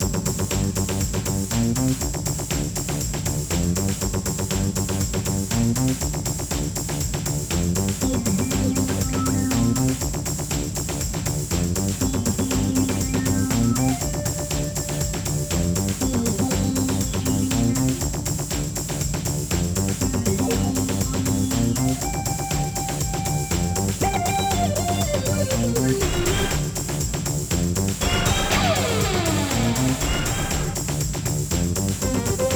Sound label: music race; TyTheTasmanianTiger3; XBOX